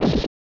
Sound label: Hit